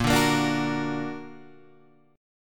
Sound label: A sharp Augmented A sharp; x,x,8,7,10,9,8,7